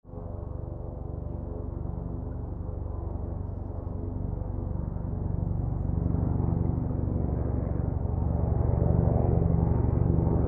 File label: helicopter past flight